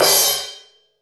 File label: crash choke, Drums Percussion; Drum Bass; Zero-G; Total Drum Bass